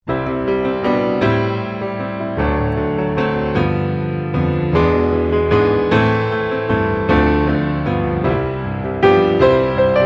We Wish You A Merry Christmas, without Jingle Bells